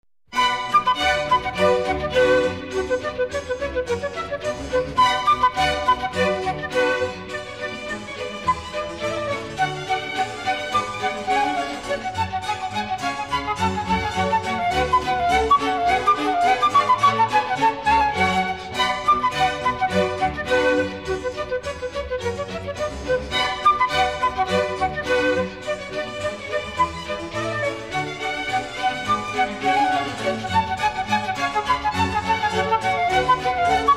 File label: Orchestral Suite No.2 in b minor, BWV 1067 'Badinerie'; 관현악 모음곡 제2번 BWV1067, 제7곡 '바디네리'